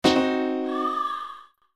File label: ranking try again; HeavenStudio; Rankings